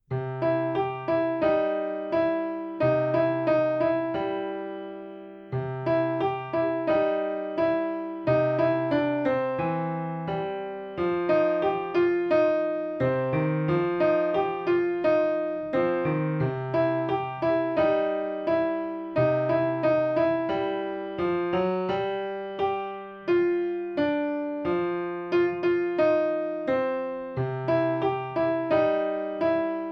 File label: Piano Junior Blues